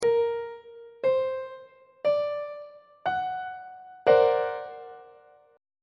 e double flat augmented 7th 2nd inversion on treble clef